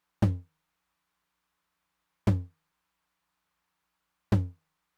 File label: Low Tom, Roland TR-909; drum machine; Roland TR-909 Ableton Project